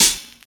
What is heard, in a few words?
Hihat A Key, hat sample tuned to the A note, Loudest frequency: 6210Hz